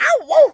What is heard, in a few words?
ow woo; ooh ahh; ow ouch